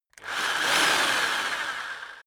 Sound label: rock drill rev reverse double; maschinen; bohrer